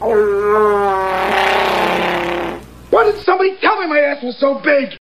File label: Fart; Wookie